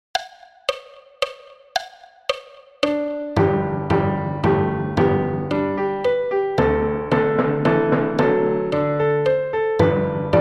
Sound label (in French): Menuet Haydn tutti 112 bpm